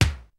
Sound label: kicks snare, kid; Kid Nepro; Elite; KICKS SNARES